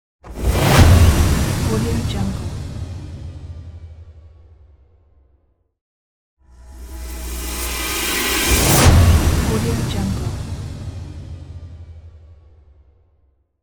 engine failure

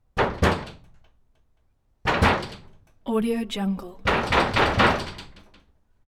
barn door wood

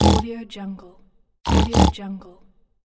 cartoon pigs

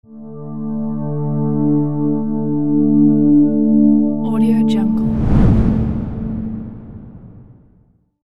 deep swoosh logo

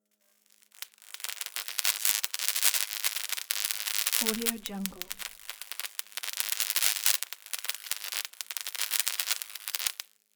electric sparks

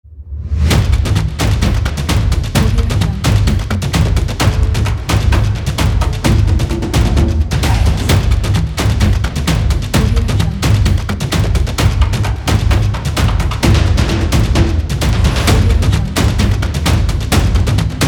upbeat drum

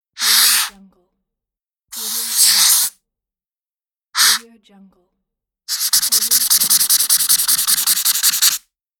marker pen writing

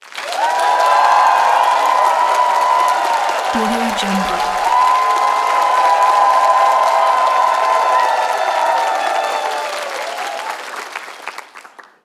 crowd cheers applause

